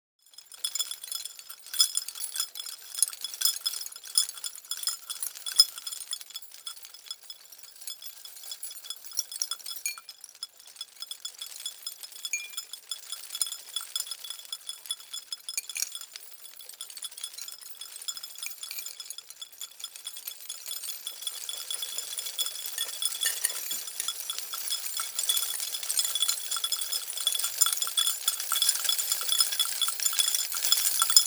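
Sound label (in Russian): Люстру трясут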